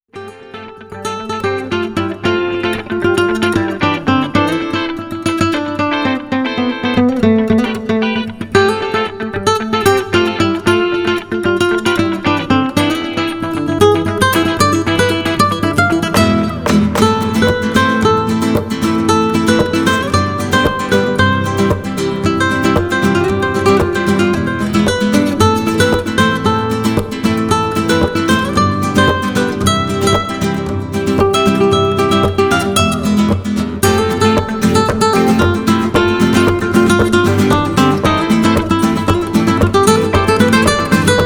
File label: Disco Rumba